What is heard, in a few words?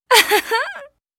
Accept